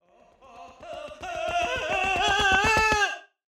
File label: Chase